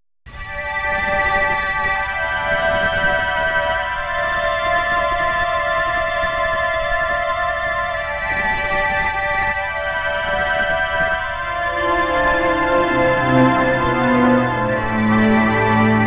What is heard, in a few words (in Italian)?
strumentale